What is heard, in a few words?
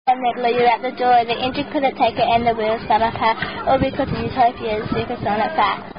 Supersonic Fart; janice ackerley collection